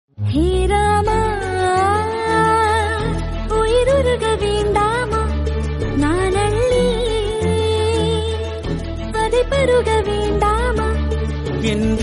romantic tamil

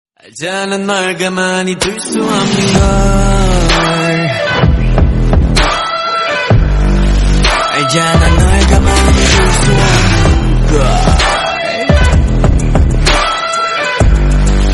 korean song

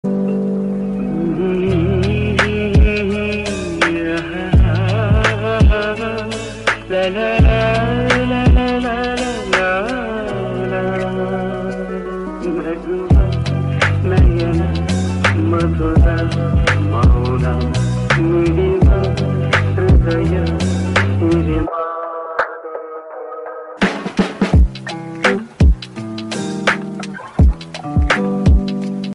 kannada song remix

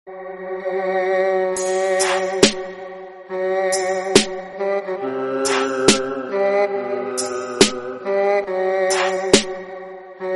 instrumental

